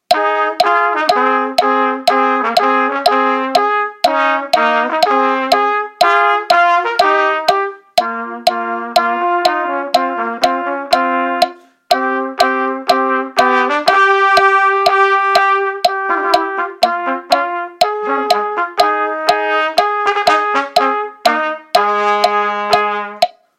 Easy Duets, both parts, qn=122, trumpet

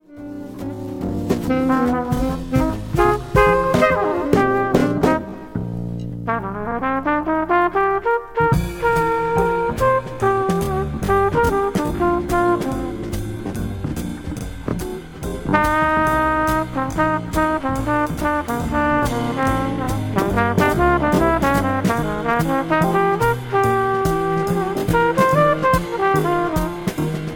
trpt solo only; qn=143; jazz trumpet

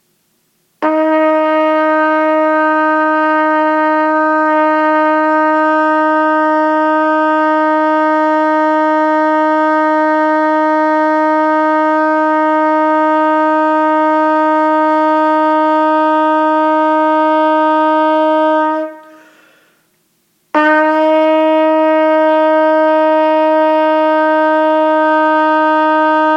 Long Tones Low F, Trumpet

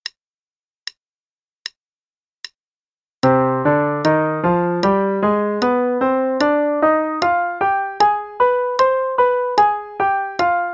c harmonic minor Scale only (qn=76); 8th notes = 2 octaves; CM Level 4; Daily Warm-ups

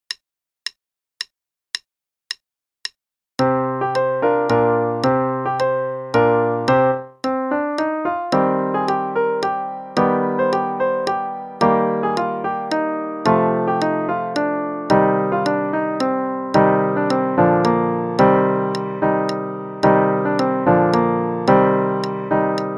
Play-along, c minor, qn=110; Persian Music

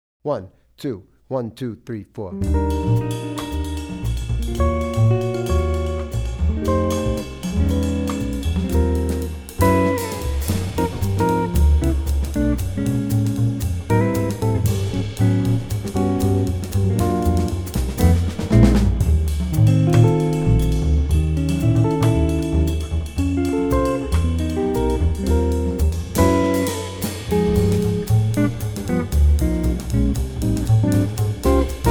Play-a-long (modern); Jazz Piano; 8 modern choruses in Eb

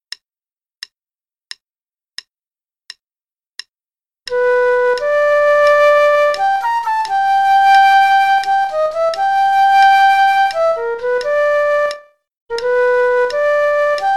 Amazing Grace (click with flute, qn=88); Level 3A - page 36

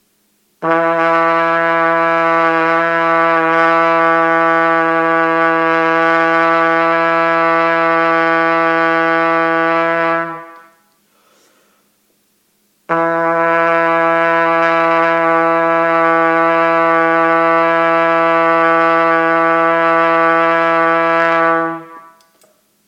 Long Tones Low Fsharp, Trumpet

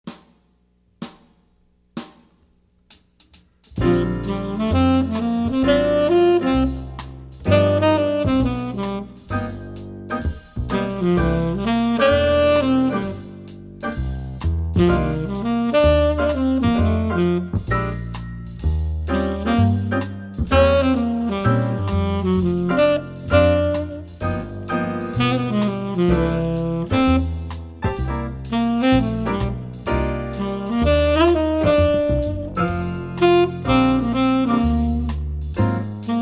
Swingin'; 15 Easy Jazz Etudes; CM Level 2 Solos (jazz)

